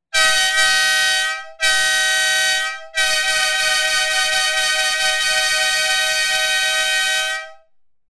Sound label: train horn samples